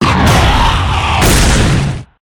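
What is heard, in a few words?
real undead golem boss attack, monster; thedead3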